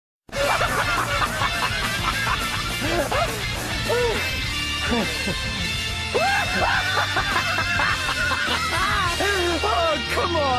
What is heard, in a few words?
reaction laugh; terumi